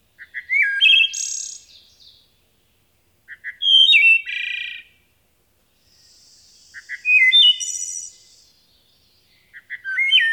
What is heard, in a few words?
wood thrush song NY